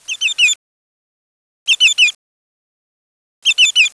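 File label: Greater Yellow-Legs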